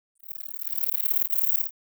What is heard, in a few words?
Neoconocephalus affinis unclipped down